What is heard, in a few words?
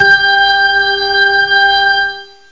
hammond c4; Zoom - Release 2 (1996); Active Software; synths